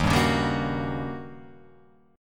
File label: Eb 7th Sharp 9th; strummed